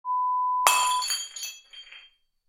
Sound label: Cup smash